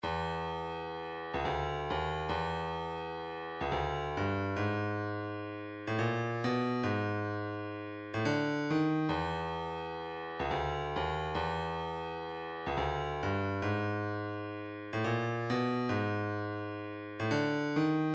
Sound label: 109 kick, midi